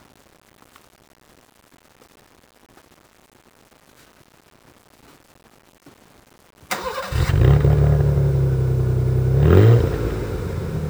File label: startup, exhaust, cadillac cts; shostage1startup; cadillac cts first generation 2003; exhaust drone